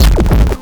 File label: grind, EMU XL-7